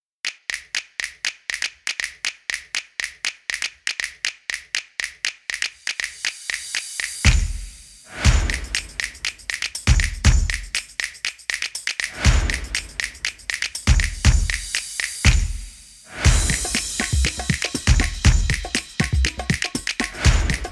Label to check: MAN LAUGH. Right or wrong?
Wrong